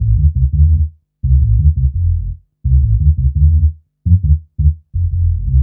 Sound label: Hum Bass; Drum & Bass; Fluid Dynamics